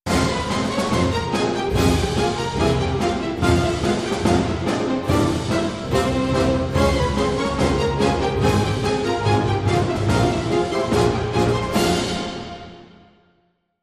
Doodling Violins

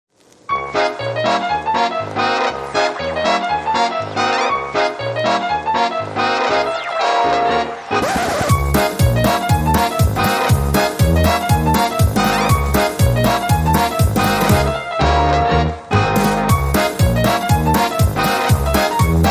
78 Revs Per Minute, No Sax No Guitar Mix

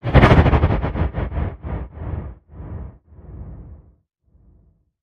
Glitch Simmer

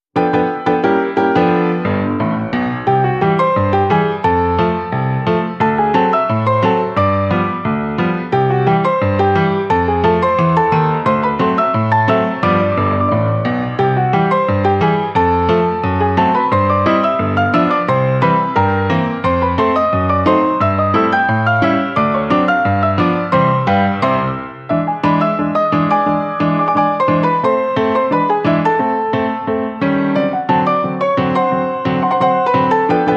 Solo Ragtime Piano

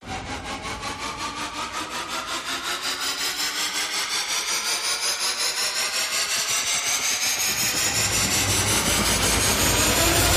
Rise Short Battery

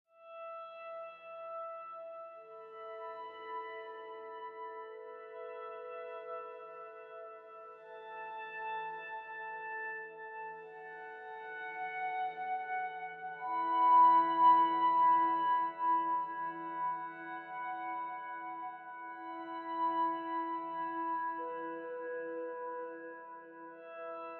Ambient

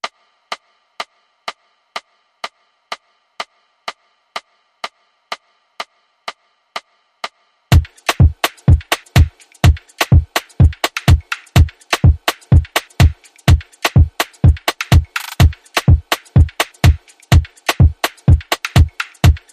African Warriors Beat

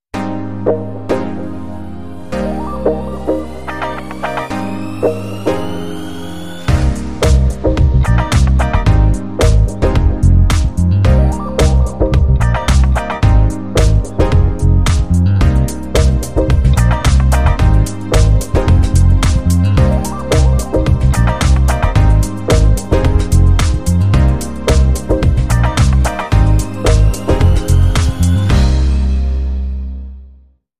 Foxy Funky

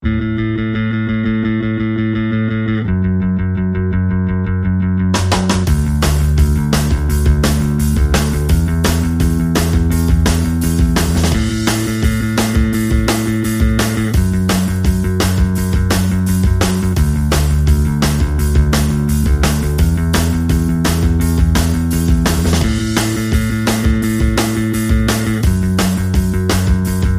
Drums and Bass Mix

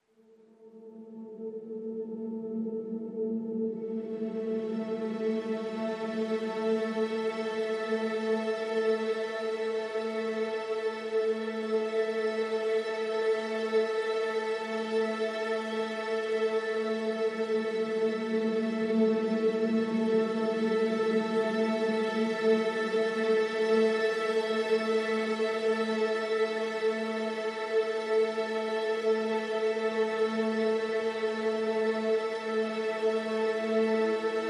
Pads Ghostly Whispers (A)

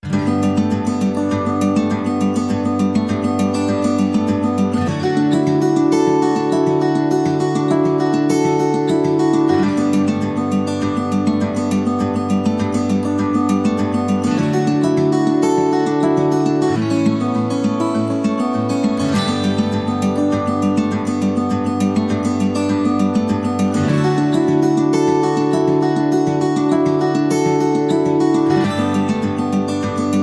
Easy Guitar

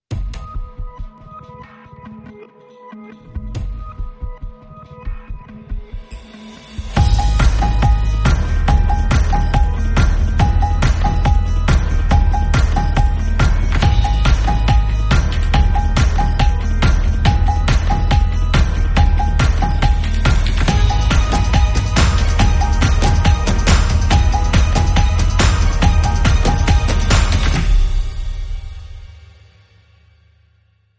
Underscore

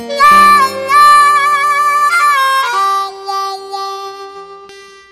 Slow Blues, Sting

